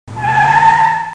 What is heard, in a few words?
car stop